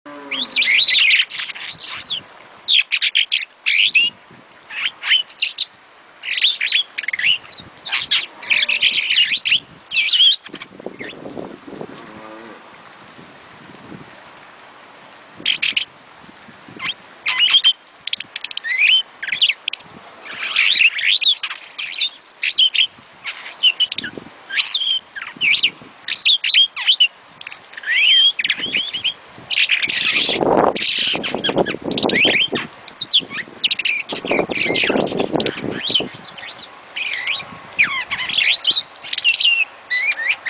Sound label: superb starling